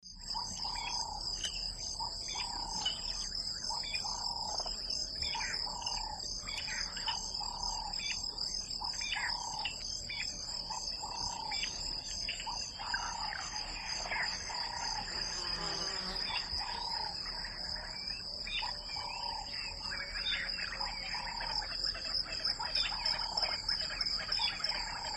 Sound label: Tanzanian Sunset